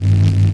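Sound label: static hum forcefield loop; electrical sparks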